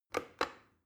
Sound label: plastic button start click regular slow